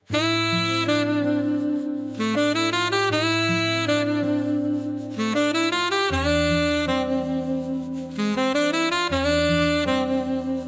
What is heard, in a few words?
sax and soul